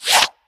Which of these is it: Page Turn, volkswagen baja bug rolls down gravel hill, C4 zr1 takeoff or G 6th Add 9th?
Page Turn